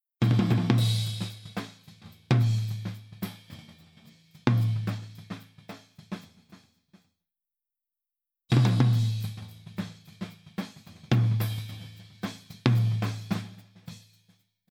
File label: Drums Tom H Tom Comp On